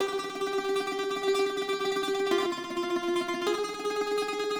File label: Lute, 104 Cmin, Hip Hop Exotica